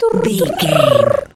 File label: voice fun character vocal crazy